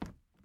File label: plastic step